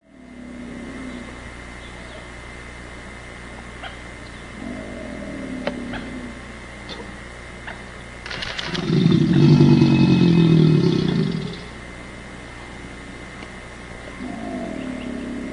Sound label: bellow